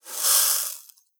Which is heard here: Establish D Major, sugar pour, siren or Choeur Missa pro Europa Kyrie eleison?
sugar pour